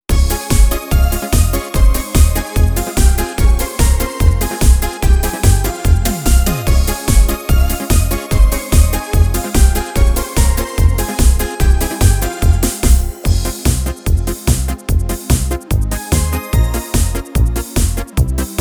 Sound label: Dance